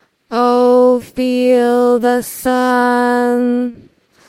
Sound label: singing